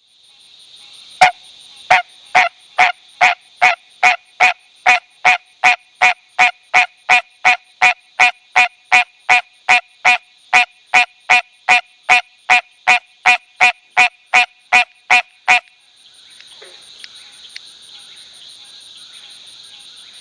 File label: frog, Call a duck-like quack; Hyla cinerea